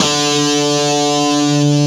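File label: A 7 guitar, Brazil Bossa; JOHNS G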